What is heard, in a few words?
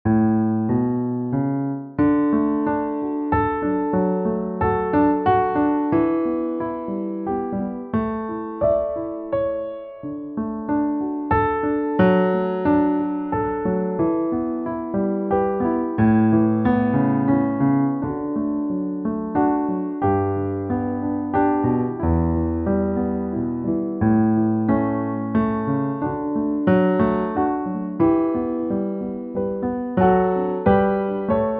Piano; Lindisfarne